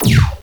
laser hero big

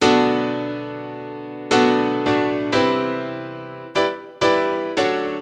Synt

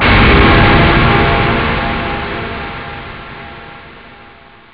orch

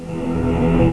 heal; Odyssey

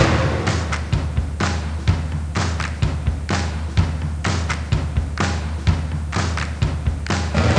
rock; VOODOO

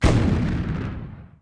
Enemy Explode; StarTrek

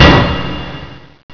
STEEL

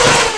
gear; Honda2000

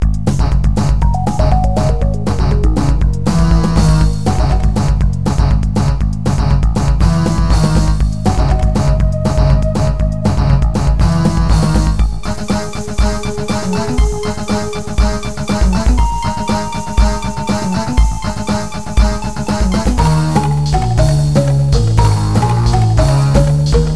Play Music; Bob the Fish 1.6

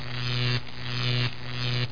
BOLT; landslor

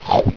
Eat Greeble; Greebles 1.0

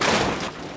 wreck; PC Gamer 4.5

